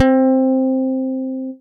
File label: Tenacious Tension, Plucked; Instruments; Ableton; Synth Essentials